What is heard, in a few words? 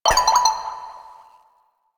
Messenger Message Receive; Ambience; Warframe; Nineteen Ninety Nine